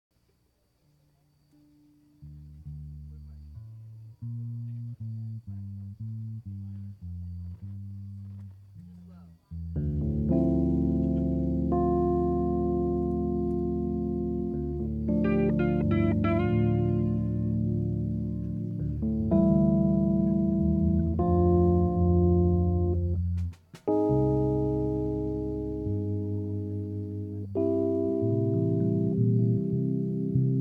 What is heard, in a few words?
Improv- Lounge